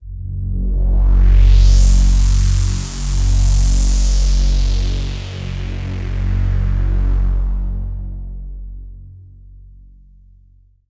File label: Deep Sweep Pad; Dr. Fink's Funk Factory